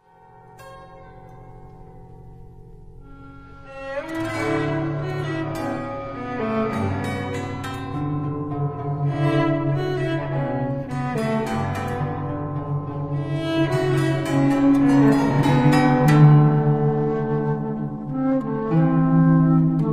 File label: Southern Ephemera, for chamber ensemble; LeBaron